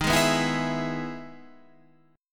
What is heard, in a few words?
E-Major D sharp